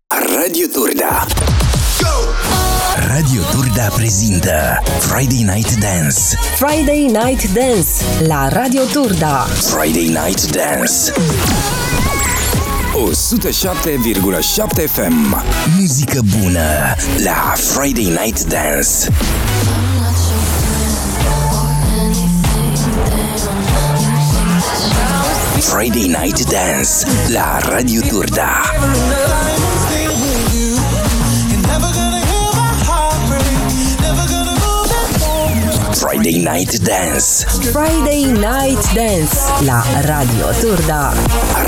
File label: Jingle